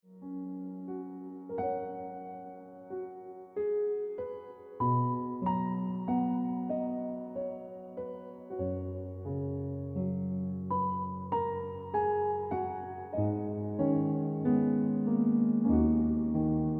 Piano Music

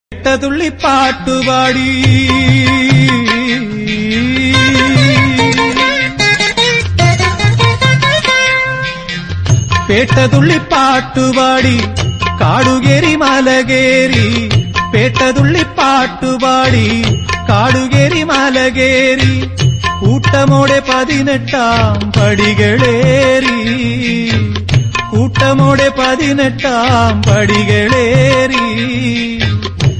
Ayyappa Tamil South Indian

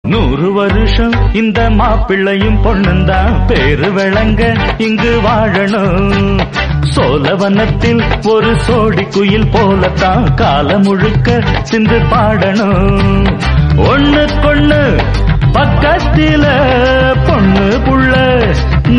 Tamil Song

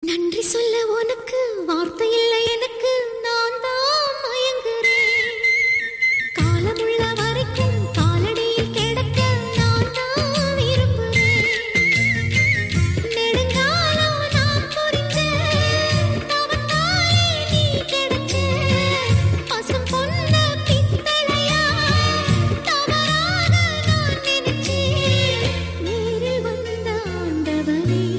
Tamil Song

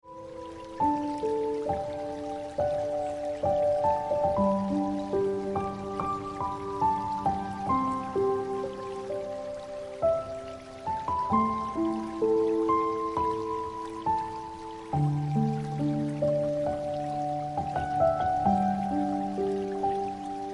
Ringtone Piano Music

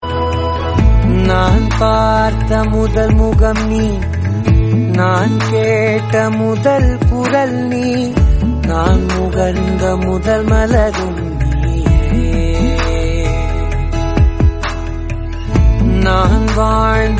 Tamil; Movie; ringtone; Valimai; Ajith

